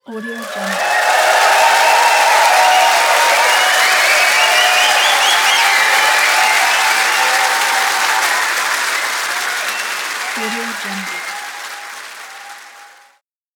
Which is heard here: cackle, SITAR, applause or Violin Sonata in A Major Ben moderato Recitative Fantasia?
applause